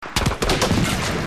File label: bullet hit